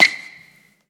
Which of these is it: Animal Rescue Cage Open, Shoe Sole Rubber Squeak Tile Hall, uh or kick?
Shoe Sole Rubber Squeak Tile Hall